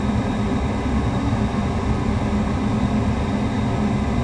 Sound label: engine; Falcon4